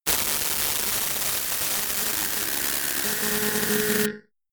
splatter, sciene fiction

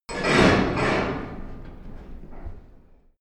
metal lock large close distant

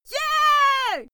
female cheer yeah; schreie; menschen

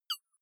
click rough high tonal, signale

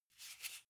car flip key handling short

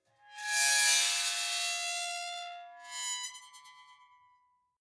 metal squeal bright

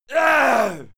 male fight attack; schreie; menschen; soundpool

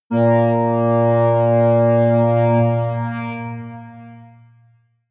synth tremble bass high

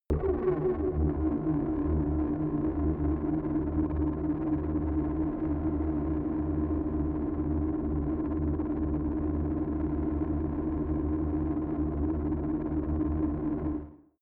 space alien ship big noisy; raumschiff

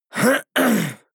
harrumph male mouth open; soundpool

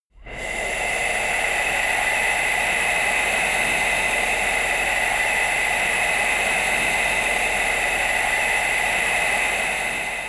air hiss scream, horror

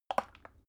bottle syrup upend bright

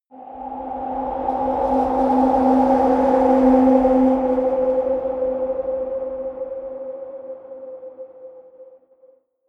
air arctic wind, drones

